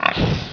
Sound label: pig grunt; HALFE LIFE 3